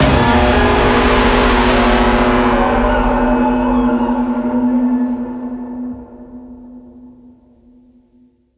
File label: Crash Stingers; Outlast 2; PS4 Windows Xbox One